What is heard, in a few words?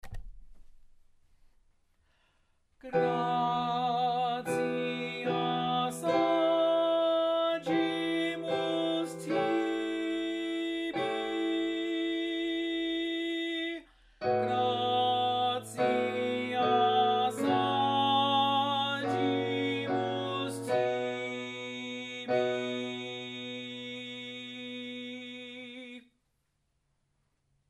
Tenor; Gratias Agimus Tibi; Gloria in D, A. Vivaldi